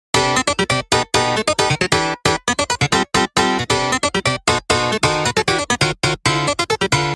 funky ahh

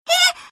heh

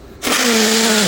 sniff

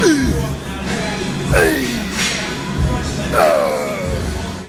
eee aay uhhh

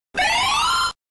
tv show buzzer

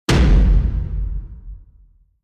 chest bump; ryu

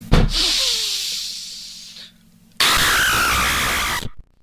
stubbing your toe

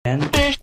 fish

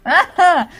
ah hah

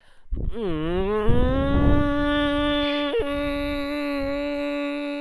mmmhh

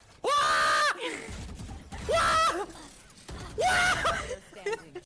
waaaaaaa

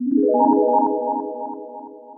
mysterious place

